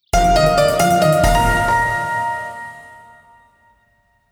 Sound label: Logo